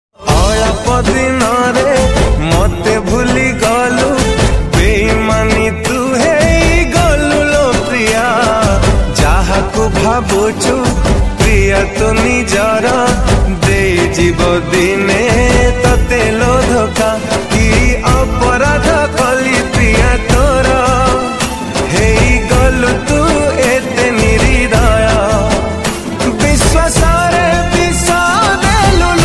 Odia Sad Ringtone

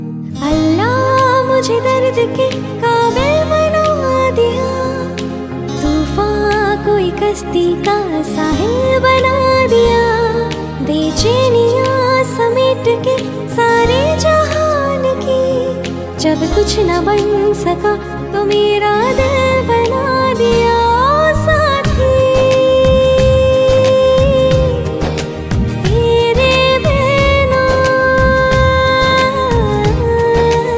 Female Version Ringtone; Baaghi 2

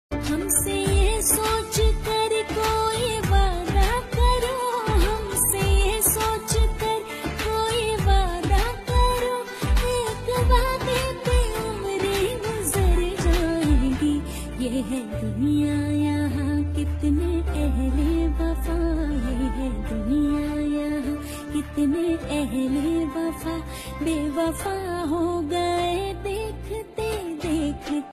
Female Song Ringtone

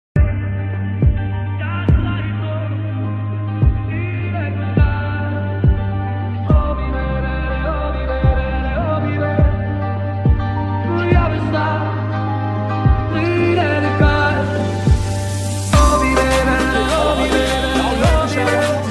Sad Mood Off Ringtone; ringtones

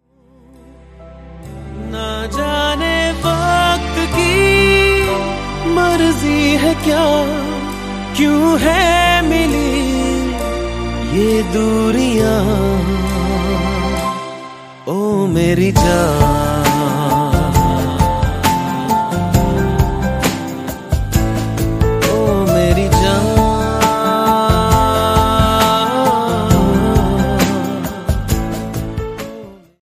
Hindi Ringtone

